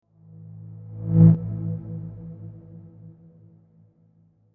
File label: swoosh